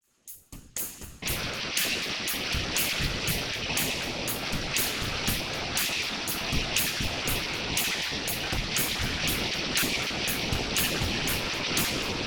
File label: ROCK RIFF Residual Noise